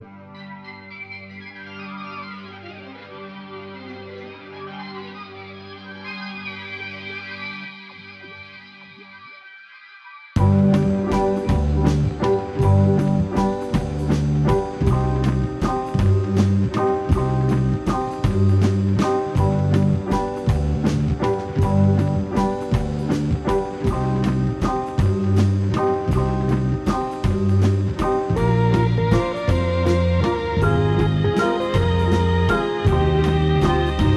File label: March of the caffinated Flautist; gforce software